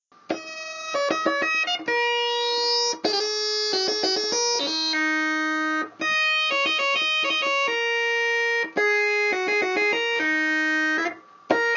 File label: bags pipe